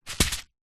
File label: food small packet of cous cous drop on surface; zapsplat